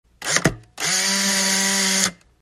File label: Polaroid SX-70 model 2, cameras